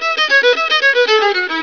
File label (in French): frotter